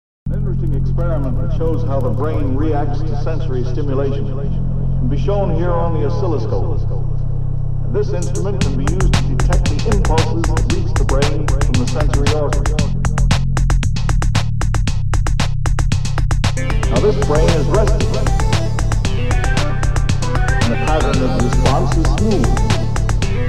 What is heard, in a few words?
sudden loud noise